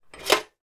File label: household knife or fork pick up from metal draining board